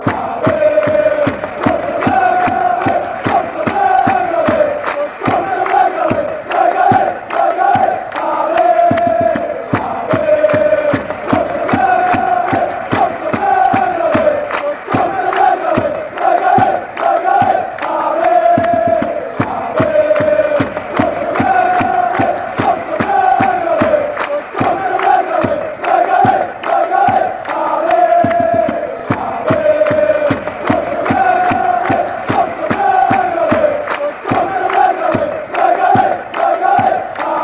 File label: go braga allez, Classic football songs from Portugal soccer fans; portuguese liga